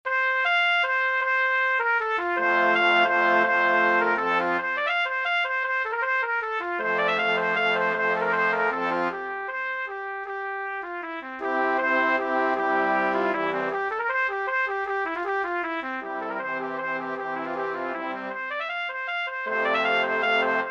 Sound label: Brass Quintet; Galliard Battaglia